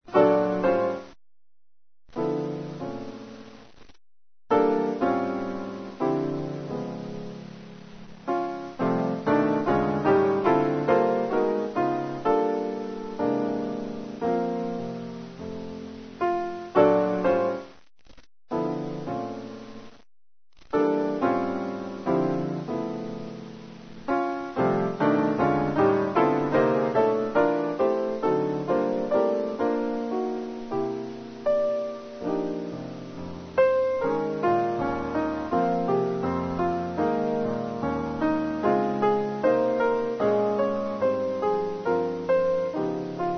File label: piano